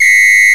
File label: LONG WHIS; Groove